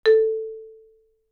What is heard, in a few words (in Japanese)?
マリンバ; 体鳴楽器; 打奏